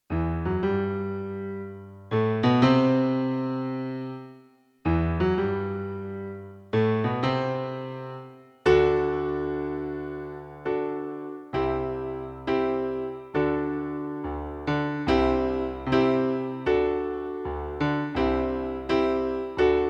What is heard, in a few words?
piano; MegaEpix